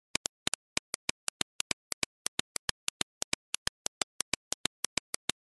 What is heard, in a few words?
keyboard